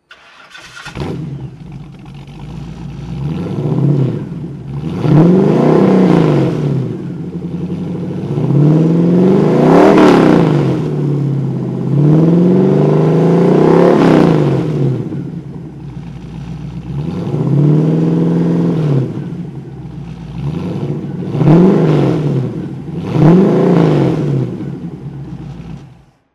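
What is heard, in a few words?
ford mustang 347 throttle, engine sound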